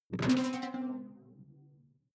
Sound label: sci-fi alien bounce detuned bright